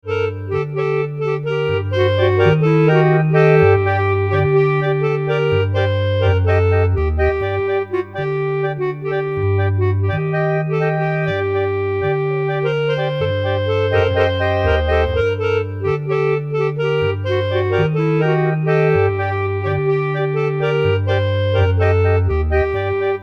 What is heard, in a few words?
Bandoneon